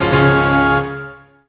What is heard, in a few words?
Piano concerto short